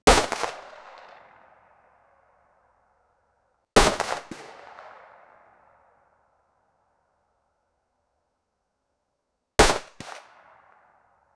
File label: realistic btr 80, cannon